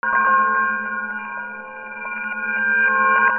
BELLS

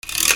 FRICTION

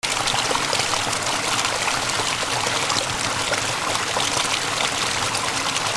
WATER

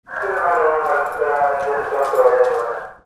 PHONES

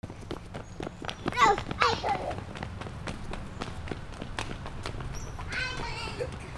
FOOTFALL

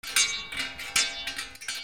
GARAGE

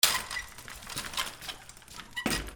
DOORS

